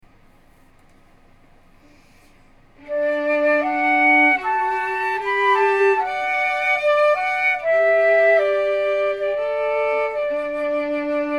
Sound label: Be Thou My Vision Flute Violin